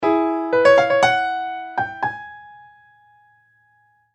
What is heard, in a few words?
chord motive; Elektra